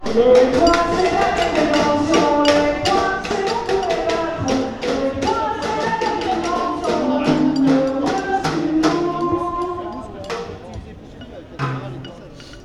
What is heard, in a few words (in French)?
Chanson Noel Greve; Manif Rouen